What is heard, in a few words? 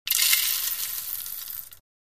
Coffee Beans Pour Pl, coffee grinder; Coffee Beans: Pour Into Plastic Cup.